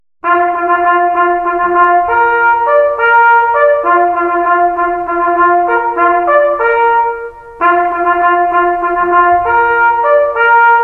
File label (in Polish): Na posilek